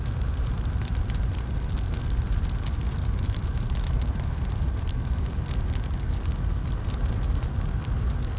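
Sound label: firepit blaze loop; Star Wars Obi Wan; XBOX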